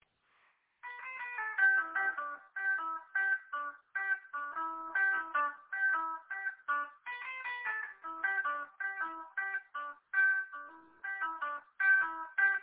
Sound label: happy go lucky tune